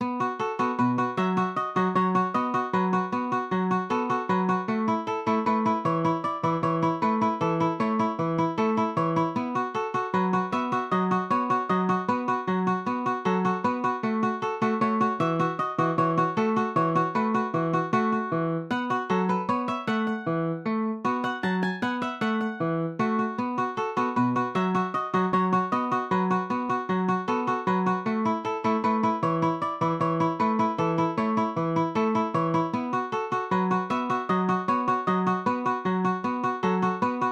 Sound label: Music